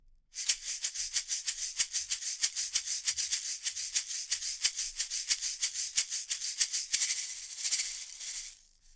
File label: akg c3000 shaker